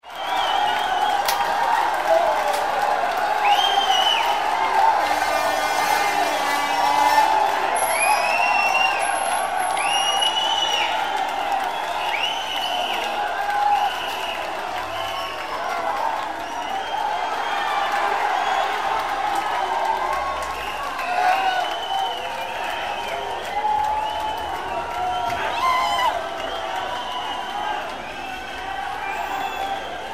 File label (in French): Foule en liesse